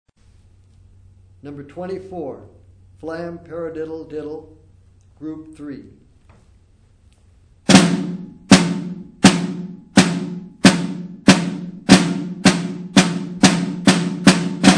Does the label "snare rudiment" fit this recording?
Yes